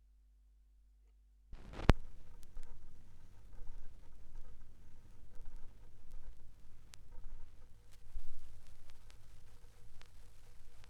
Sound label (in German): Schallplattengeraeusche